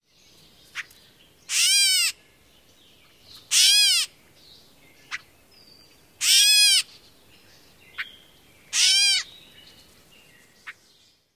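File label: Gray Catbird mews and kwuts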